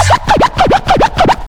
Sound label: scratch; Zero-G; Freak Beats